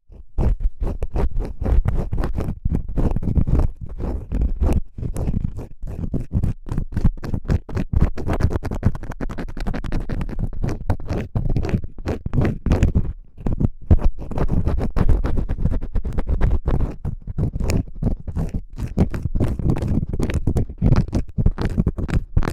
鼓膜に響く！?雑な耳かき(竹耳かき) 両耳同時

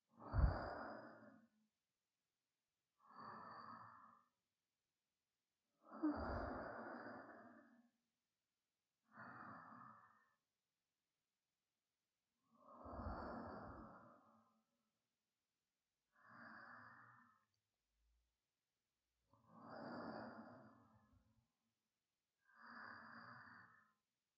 添い寝(左側), ASMR

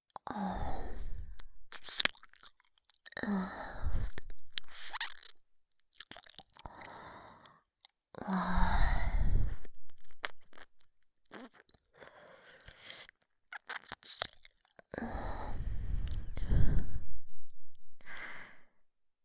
耳舐め, ASMR

